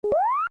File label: jump; sonic cd